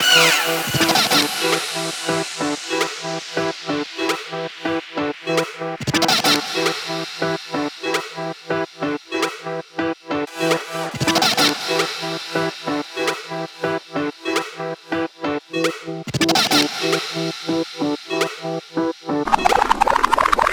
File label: Synth Loop